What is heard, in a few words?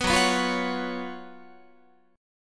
Gb Major 7th Suspended 4th Gb Guitar